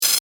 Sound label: Static Buzz, Production, Element, Static, Buzz; Sound Design; BlastWave